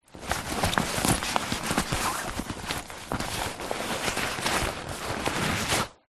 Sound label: foley bag backpack small full handling; zapsplat